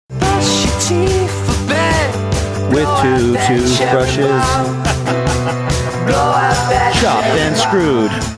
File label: singing with two toothbrushes chopped screwed over spoons